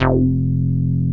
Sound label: JX3 RNG, Roland JX